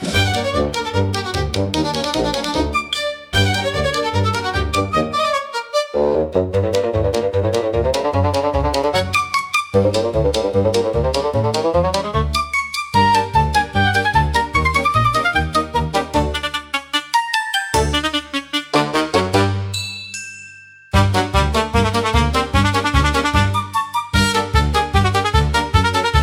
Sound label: Tuba Tumble Parade, comical orchestra for slapstick gag scene